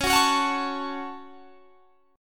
C sharp Suspended 4th Sharp 5th C sharp down, chord; strummed